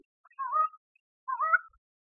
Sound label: silver crowned friarbird